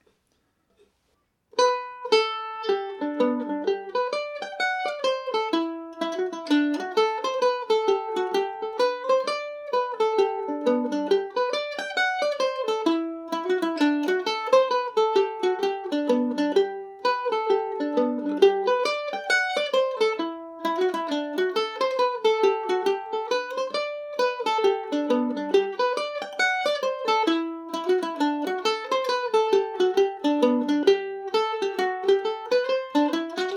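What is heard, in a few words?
seven derries G major, mandolin; The Seven Derries; G&O #34 mandolin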